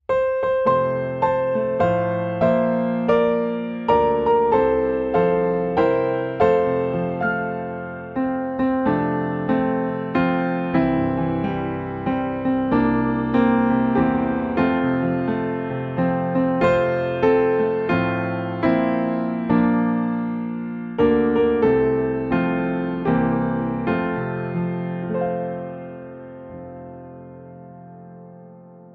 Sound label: Birthday Piano